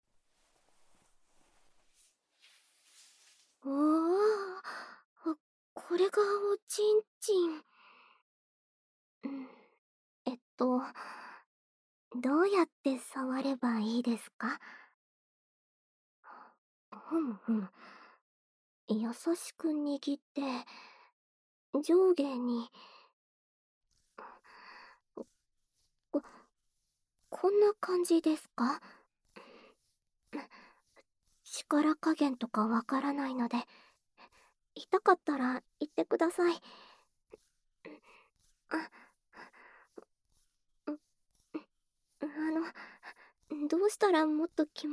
耳舐め; お兄さんの顔が蕩けちゃってますよ♪これ感じてるって事ですよね♪

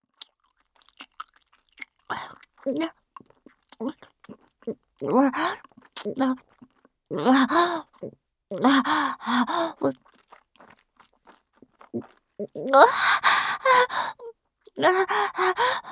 ぐちゃぐちゃごっくん; チンカスを丁寧にぐちゃぐちゃごっくん♪

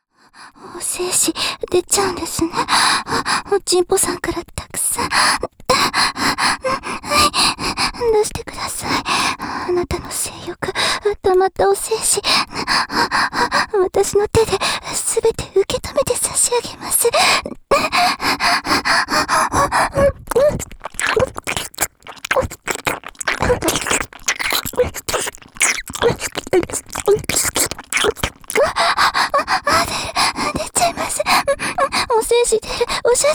耳舐め手コキ; 大量お射精♪

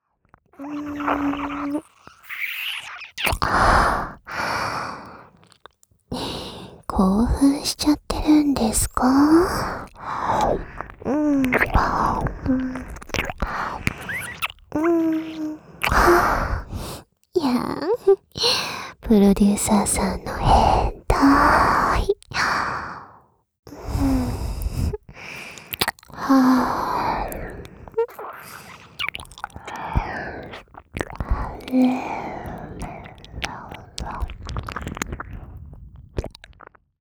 耳舐めご奉仕; 初めての耳舐めご奉仕「やぁん…♪-プロデューサーさんのへんたぁい…♪」

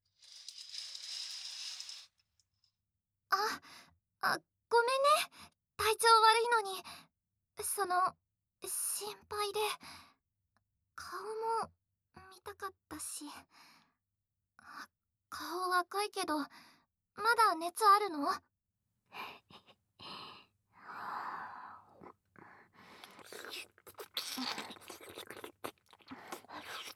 バキュームフェラ; 窓越しの姫奈と喋りながらここみにバキュームフェラ抜きごっくん

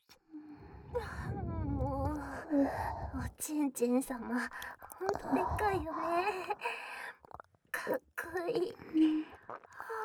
フェラチオ; りむのお口にへこへこ甘えんぼ♪至れり尽くせりらぶらぶ両想いフェラチオ♪

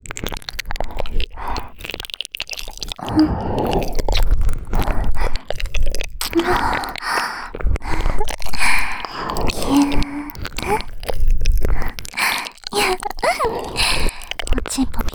カウントダウン耳舐め, ASMR; 2-4 カウントダウン耳舐め♪

